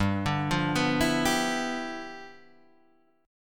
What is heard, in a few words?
G 13th G, chord